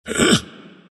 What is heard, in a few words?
grunt; dota; ember spirit